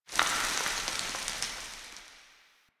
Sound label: Small Dust Rock Slides One Off; Ambience; Warframe; Nineteen Ninety Nine